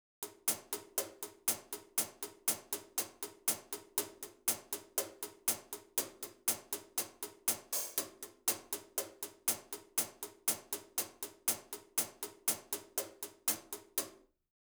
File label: HiHat